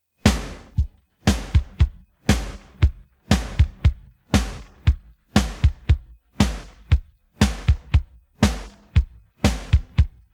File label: pattern, drums